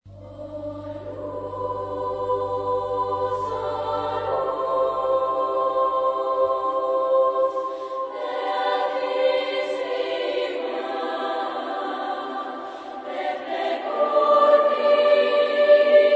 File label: female; Veni Sancte Spiritus; O Lux Beatissima; chorus